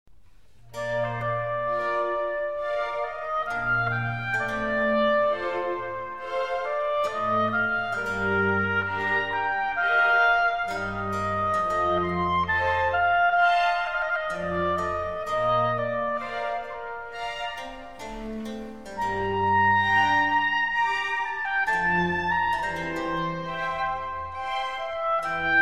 Harpsichord Concerto in F minor Largo; No. 5; (or for oboe or violin in G minor); BWV 1056

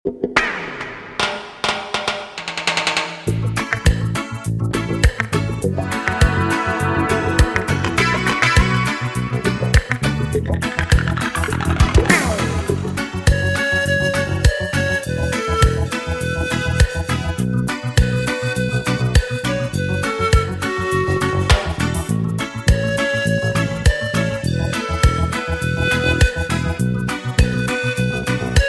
Ocarina

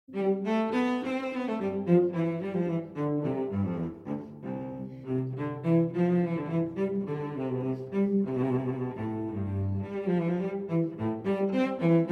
Cello Suite in C Minor Allemande; Cello Suite No. 5 in C Minor, BWV 1011; II. Allemande

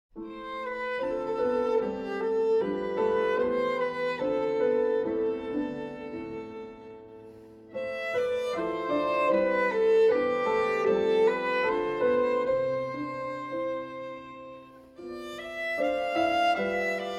Viola and Piano, Sans Vitesse et a l'Aise; Boulanger

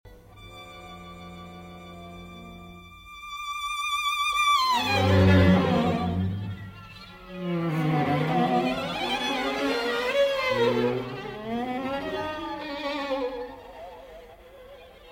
Quatuor, Trills and Bowed Tremolo; Quatuor III; 9 Etudes

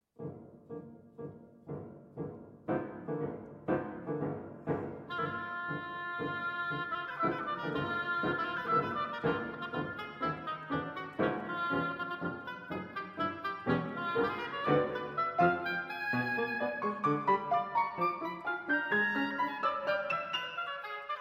Oboe Sonata, Scherzo

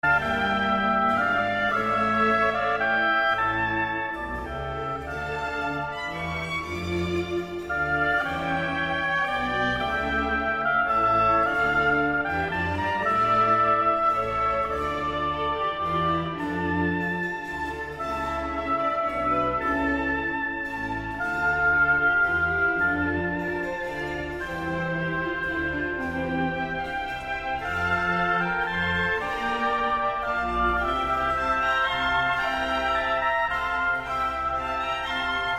Oboe Concerto in F Major, Adagio Non Troppo

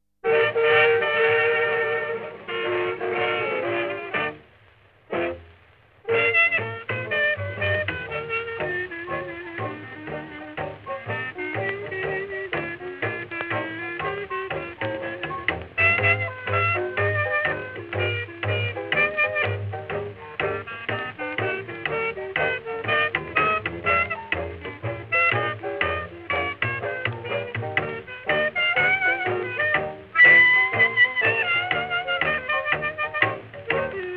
Blues

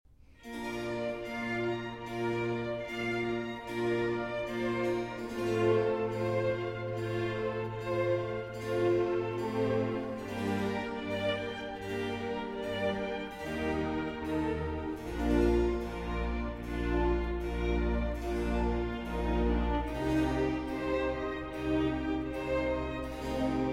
Oboe Concerto in D Minor, Adagio

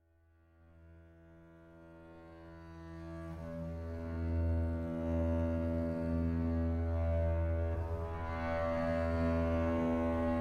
Solo Violin and Strin; Hildegard von Bingen O vis eternitatis; Arr. Tormod Tvete Vik; Strings

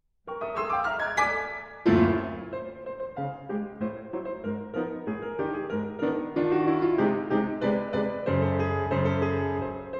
Piano Suite, Polka. Tempo di polka moderato; No. 4, AK 74

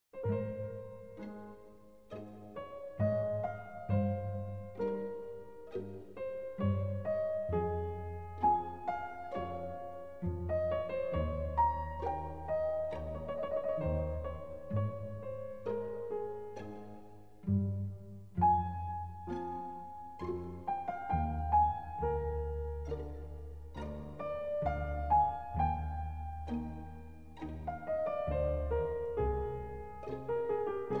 Keyboard Concerto in F Minor, Largo; BWV 1056

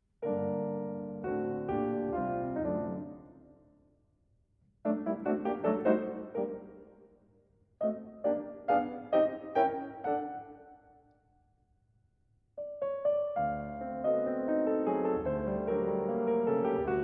Piano Sonata in G Minor; 1. Allegro

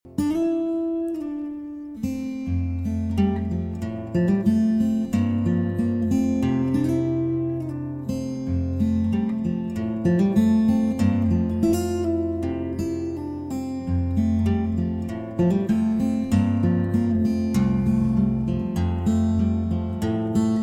Instrumental

